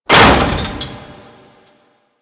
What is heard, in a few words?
Shipyards Walkway Close; Ambience; Props; Warframe; Grineer Shipyards